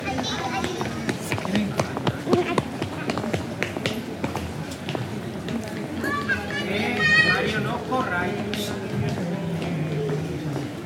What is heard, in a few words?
Kid Running